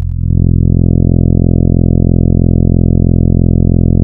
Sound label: Instrument Trombone; Ableton Live 12 Lite; Vector; Sprites